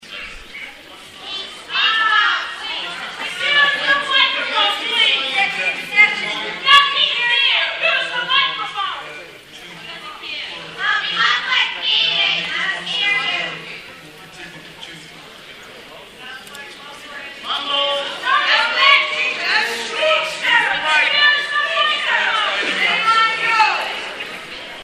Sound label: atmosphere